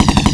Skateboardkid Shoot; Playable Tutorial Level; TR1

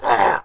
Hybrid Attack; Playable Tutorial Level; TR3

